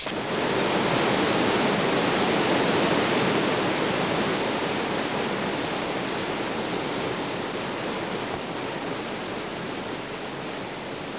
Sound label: Water, 自然, 水声; 特酷声音素材库